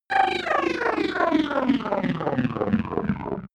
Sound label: stopping fighting racer